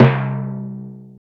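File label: R8-PRC, R8 percussion; E-Lab; X Static Goldmine 1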